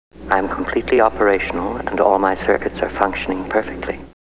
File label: boot